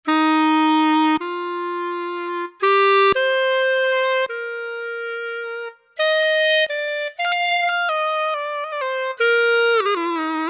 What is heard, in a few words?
Arpy Clarinet, Roland V-Synth; Factory Patches